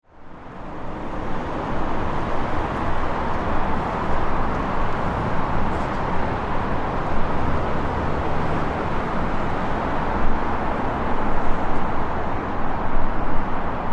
intense traffic noise under an overpass

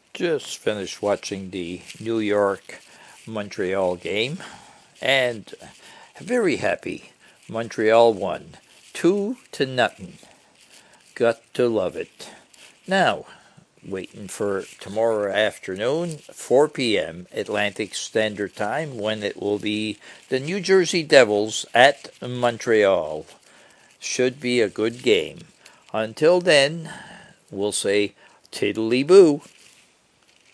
NHL win; montreal canadian

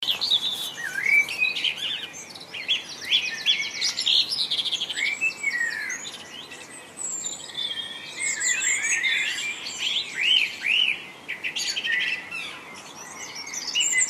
dawn chorus, kent

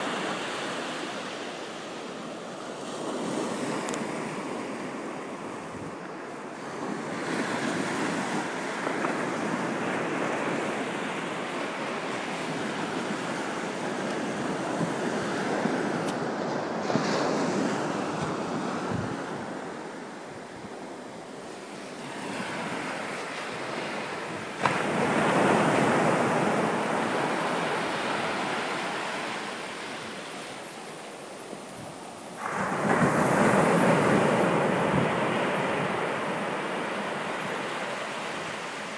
waves on newborough beach; gorgeous walk in a beautiful place

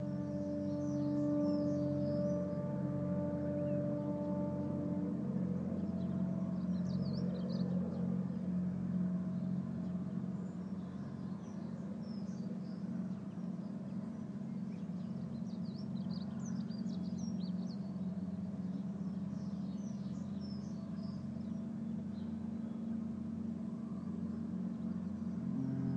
Solent foghorns

